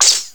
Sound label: laser gun; drums